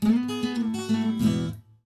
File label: draw; jocly